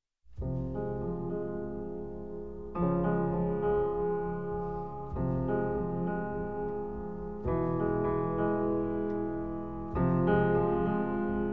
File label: etude for piano; nonesuch